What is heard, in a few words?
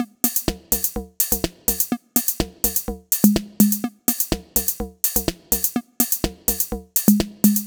125BPM TOP LOOP